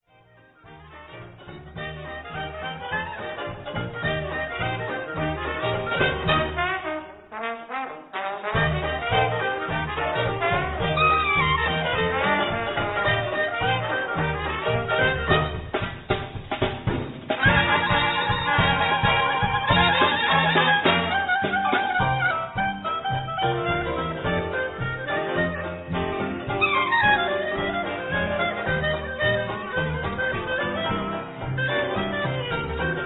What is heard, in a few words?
jazz blues